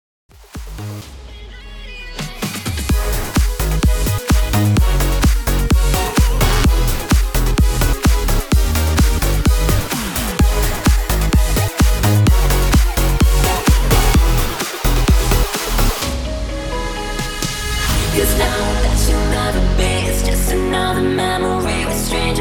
128 BPM

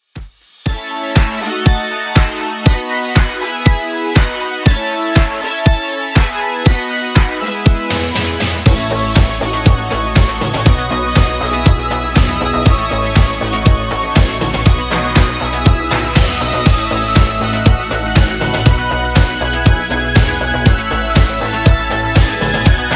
80s Redrum 120bpm

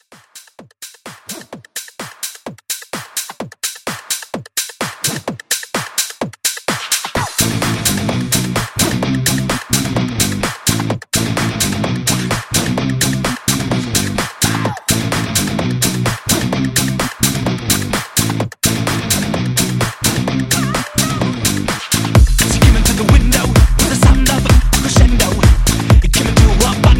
House Quick Reboot 128

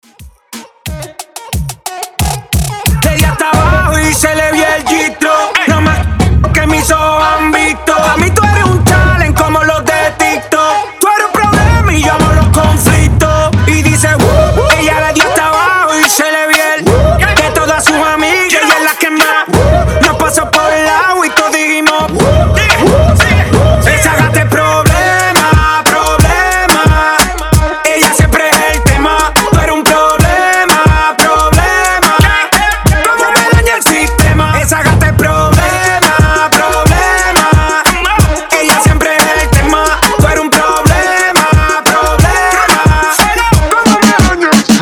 Transition 90 97 BPM

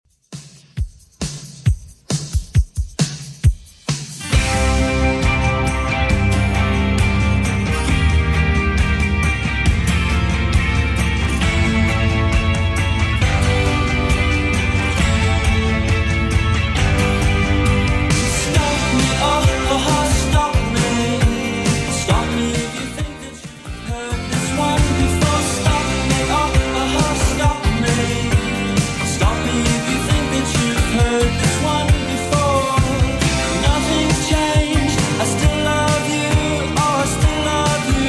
80s Redrum 135bpm

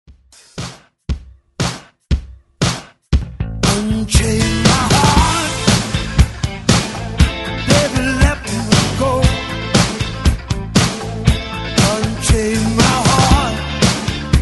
80s Re Drum 118 Bpm Clean

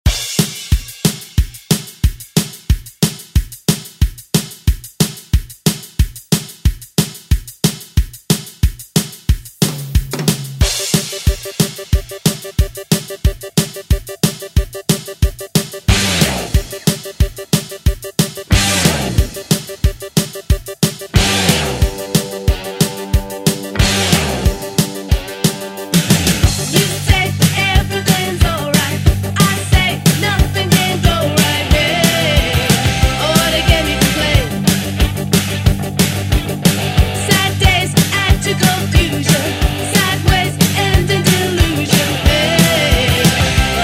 80s ReDrum; DJ Mhark

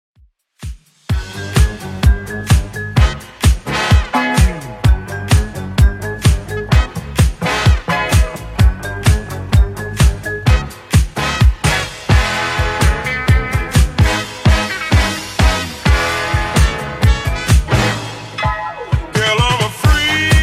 70s Redrum 128bpm

